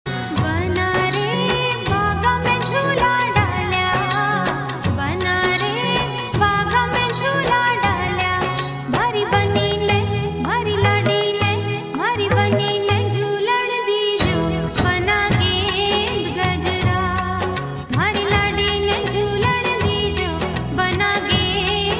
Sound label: Indian Classic